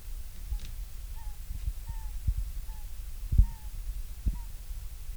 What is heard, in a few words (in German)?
fiepsen, octodons